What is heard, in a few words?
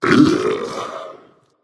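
nonverbal ugh; smite; voicepack; merlin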